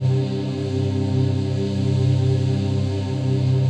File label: digi pad, Pads